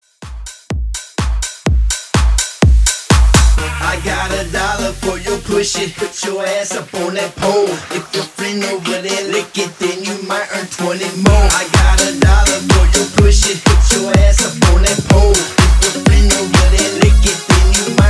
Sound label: dance hype up beat 125